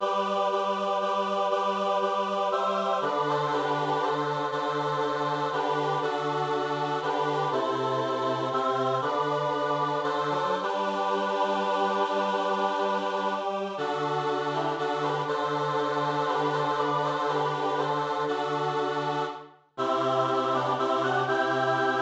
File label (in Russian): Полиелей Афонский напев (G-dur)